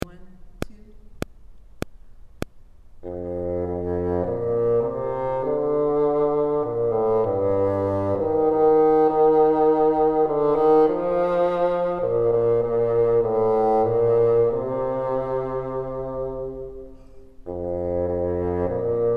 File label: top, bassoon